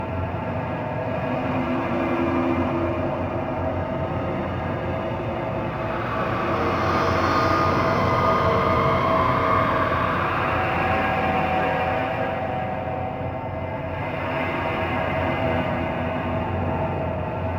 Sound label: Asteroid Pipe Tunnel Amb Loop; Warframe; Grineer Asteroid; Interior